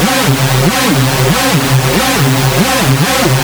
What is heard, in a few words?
Hard Hoover, hard style